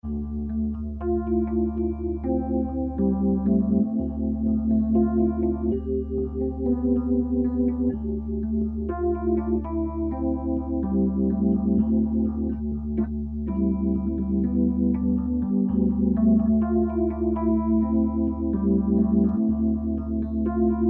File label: Fender Rhodes